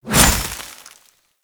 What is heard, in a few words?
Hover Whizzby Angry; Pax Duviricus; Warframes